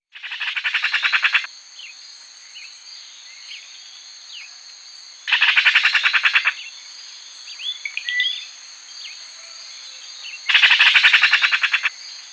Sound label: Martin pecheur ventre roux Ringed Kingfisher, chants des oiseaux; Guadeloupe